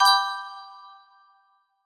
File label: 1st chord, music box melody